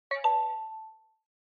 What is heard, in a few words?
Smartphone UI Sound Speaker